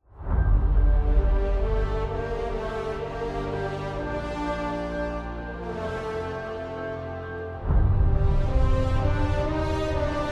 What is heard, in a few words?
Epic bare basics with atmo boom ostinato